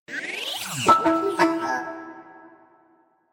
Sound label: BB 8 like tone